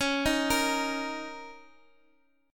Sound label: A sharp Minor Double Flat 5th, C sharp